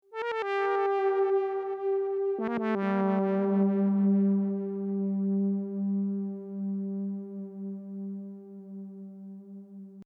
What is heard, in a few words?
City Horn